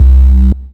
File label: Basse, techno acid